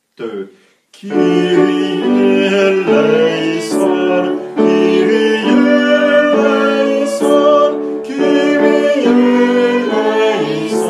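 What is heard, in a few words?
Kyrie soprano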